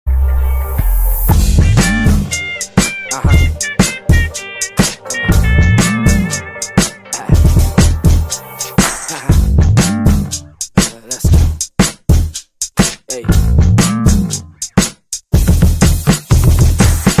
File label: ringtone, tamil; jigarthanda